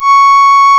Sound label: REED C#; Big Fish Audio; Synclav FM